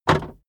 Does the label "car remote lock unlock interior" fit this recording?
Yes